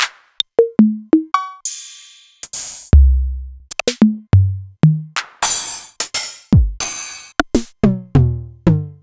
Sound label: Novation Drumstation; DrumstationV1